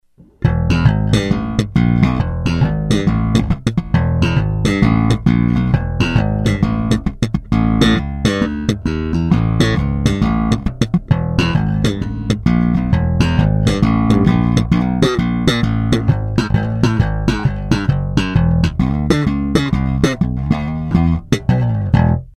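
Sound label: fender badass slap, bass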